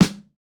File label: snare, snare house